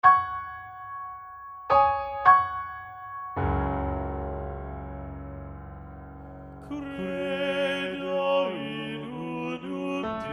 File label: SATB Alto Predominant; Credo Missa Festiva; John Leavitt; Misa Festiva Credo